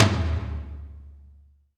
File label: Tom XLo Cannon, Alesis D4 drum machine